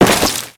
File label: Crewman Step Flesh, Footsteps; Warframe; Corpus